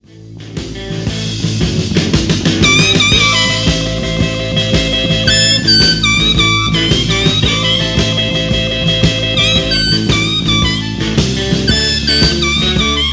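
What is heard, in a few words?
harp 1st Position Solo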